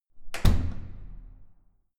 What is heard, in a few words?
security door close